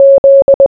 Morse Code Z

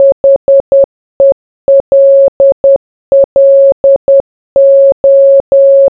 Hello in Morse Code

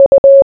Morse Code U

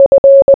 Morse Code F

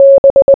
Morse Code B

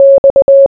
Morse Code X

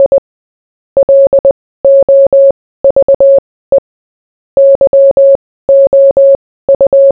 Morse Code For I Love You

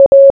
Morse Code A

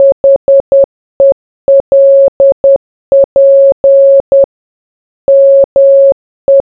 Help Me in Morse Code

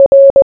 Morse Code R

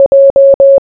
Morse Code J

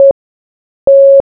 Dot vs Dash Morse Code

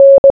Morse Code N

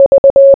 Morse Code V

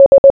Morse Code S

